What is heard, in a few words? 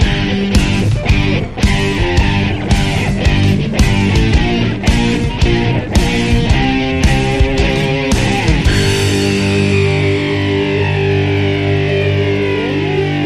Modern Rock Fuzz